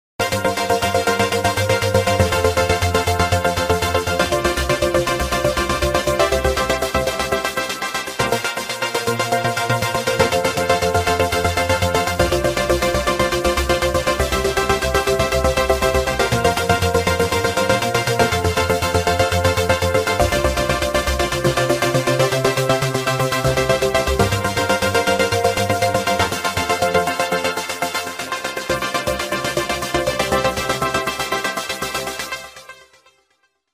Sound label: PAD; VIRUS TI; VKB3; NOT-PAD RP